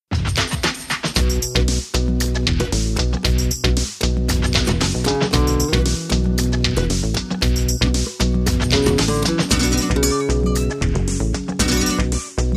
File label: rhumba